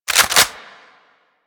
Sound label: Pump, shotgun; DE; Warframe; Weapons; Strun